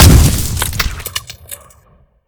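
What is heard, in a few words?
weapon fire player; gmod; weapons; iw8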